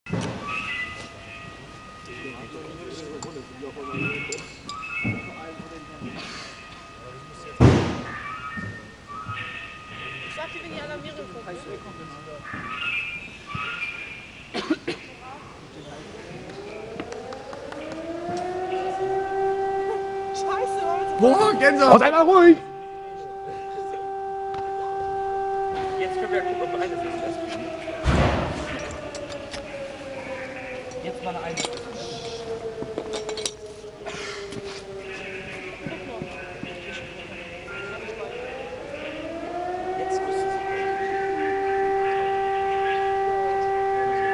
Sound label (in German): Sirenenalarm und Funkspruch; fuer Strohkirchen